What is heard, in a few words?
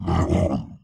kagouti injury; skywind